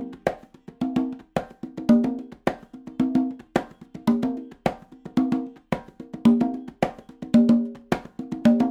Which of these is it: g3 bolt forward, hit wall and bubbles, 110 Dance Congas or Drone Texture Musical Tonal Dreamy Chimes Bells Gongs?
110 Dance Congas